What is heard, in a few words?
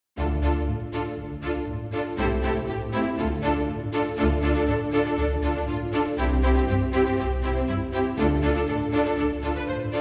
The Drunken Sailor - Arranged for Strings; hold music